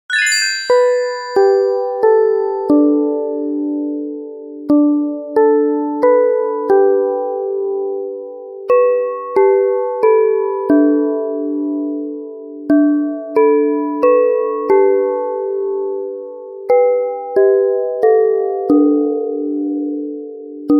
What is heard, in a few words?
bells, csound